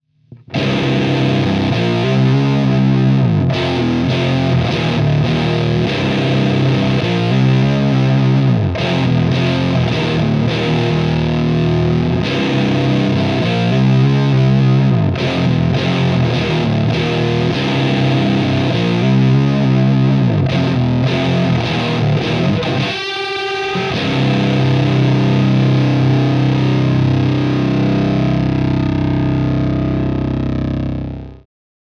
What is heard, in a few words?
GTR GAIN 75 CLIP OFF SCOOP ON